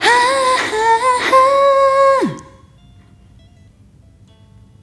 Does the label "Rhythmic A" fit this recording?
Yes